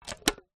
food tin biscuits close; zapsplat

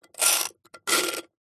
foley rock concrete knife blade scrape scratch; zapsplat

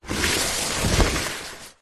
card box product pack slide on hard surface; zapsplat

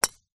Golf Driver

